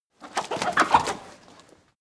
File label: chicken cackle